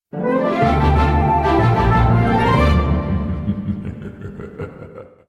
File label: Orchestral Transition Positive Stinger; gamerip; magic rampage